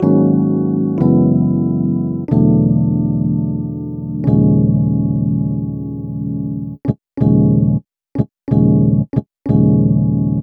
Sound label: Rhodes, Cinematic Funk